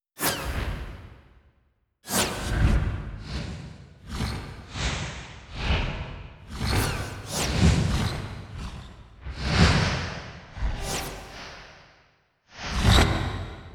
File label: Fly Bys; Pest Shield